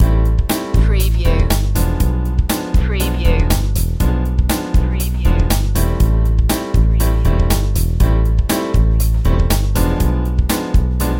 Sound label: synth mambo groove